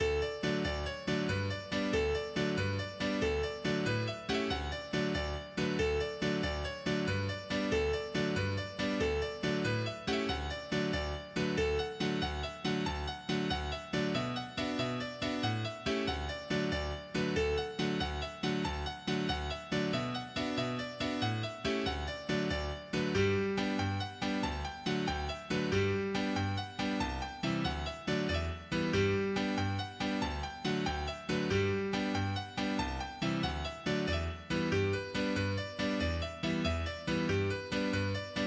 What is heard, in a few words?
Jig Of Slurs